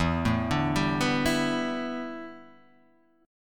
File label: A 9th Suspended 4th E